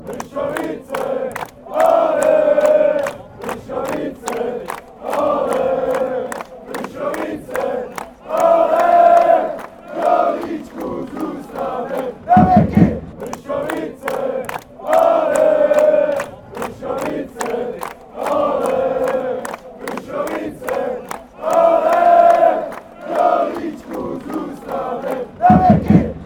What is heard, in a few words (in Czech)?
allez boh, chants